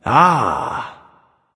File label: "ah", discover; smite; voicepack; ullr